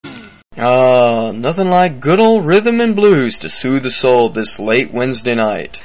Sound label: Good Old Blues